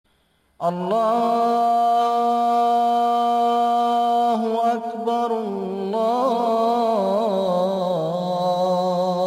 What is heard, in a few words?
prayer call